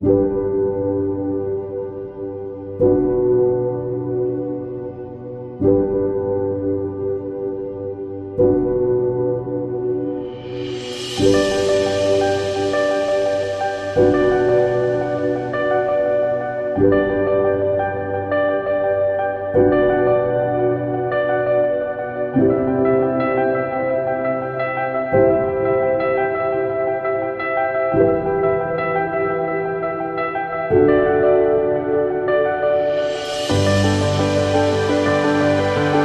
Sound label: Piano